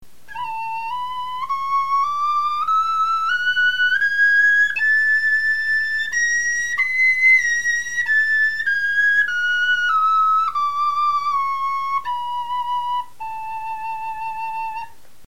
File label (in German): tonleiter, knochenfloeten